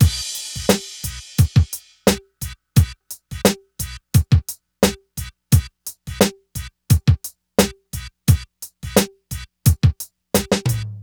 drum, Hip Hop and R&B Drumloops; Best Service ProSamples vol.01; AKAI

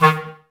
Clarinets, Wood Winds; Miroslav Vitous - Woodwind Brass Ensemble; 90's Sample CDs

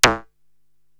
Zap, Korg TR-Rack drum machines

